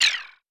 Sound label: Lasers; Super Smash Bros